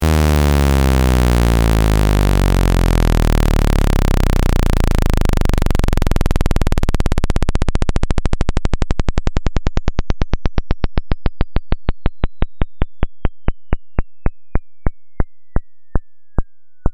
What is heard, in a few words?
saw sub audio test 20 20k 2048